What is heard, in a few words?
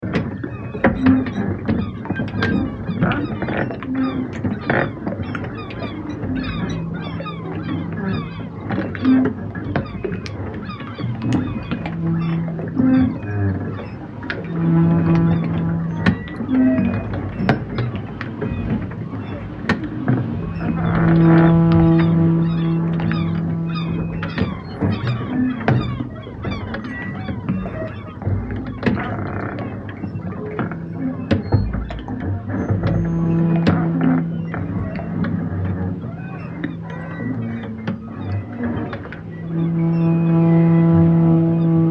All The Birds And A Telephone Ringing